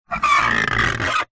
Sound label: metal sharp metal object hard scrape on metal panel surface; zapsplat